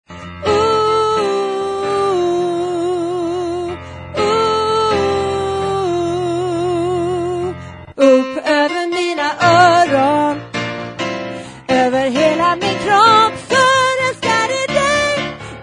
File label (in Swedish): upp over mina oron bas, stämmor